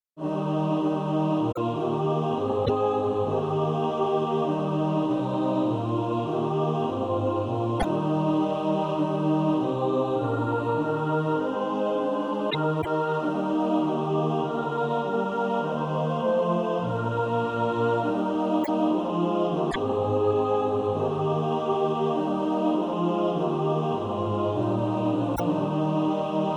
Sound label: mixed